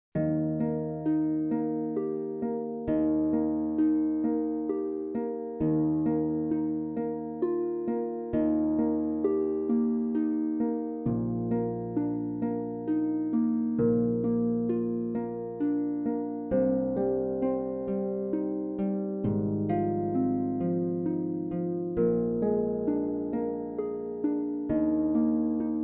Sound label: menuet de larlesienne harp